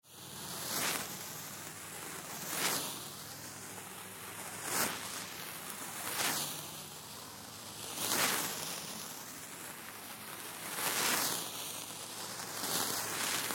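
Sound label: Fire Sparklers Multiple Burn Bys close-up Fast; Chinese New Year; Big Room Sound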